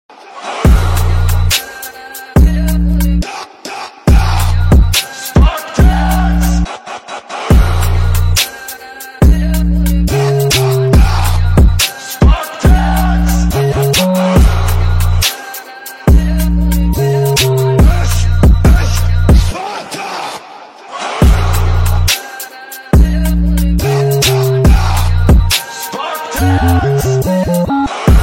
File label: Drill Ringtone Remix; Sparta